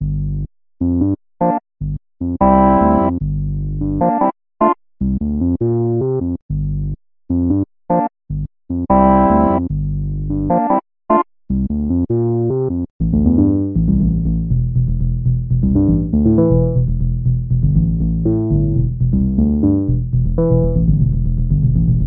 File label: moog prodigy bass